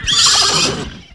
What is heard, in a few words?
WHINNY; Greytsounds VOL-1